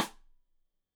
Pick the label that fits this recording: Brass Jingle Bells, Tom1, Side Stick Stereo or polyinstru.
Side Stick Stereo